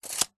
industrial tape measure retract short fast hard close up